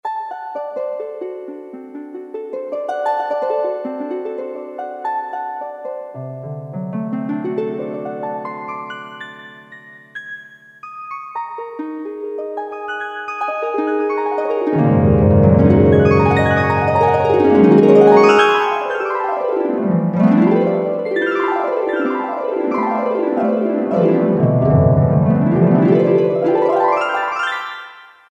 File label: Harp; Voices